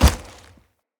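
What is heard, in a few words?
Heavy Grunt Boot Walk Vegetation, Footsteps; Enemy; Sinecure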